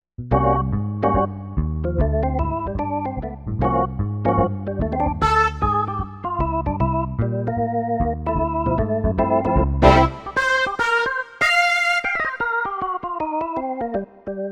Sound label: Jazz Hits